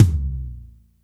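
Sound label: TOM soft; Zero-G; Chemical Beats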